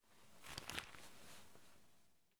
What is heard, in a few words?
Throw Out Cigar; This Is the Police